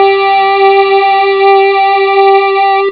square octaves; chipmusic; sonix; Rise Of The Dragon

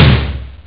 snare, instruments; sonix; Rise Of The Dragon; chipmusic

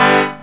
E Piano instrument; sonix; chipmusic

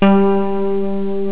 elecpno; sonix; Rise Of The Dragon; chipmusic

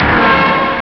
Horn Blst; sonix; chipmusic

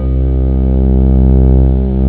fantasy instrument; sonix; Rise Of The Dragon; chipmusic

